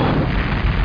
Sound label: saucer ex; wincastl